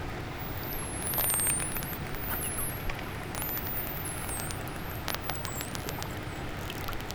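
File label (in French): bleu blanc rouge dauphin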